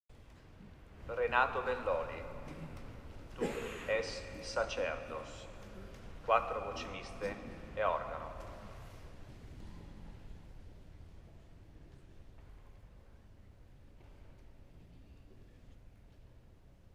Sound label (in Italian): coro; s. alessandro